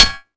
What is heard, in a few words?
metal small